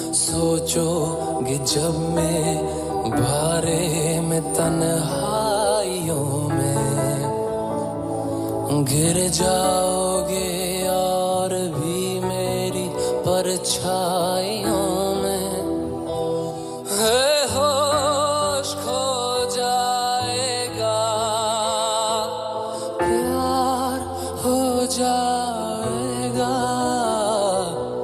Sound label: Hindi Romantic Song Ringtone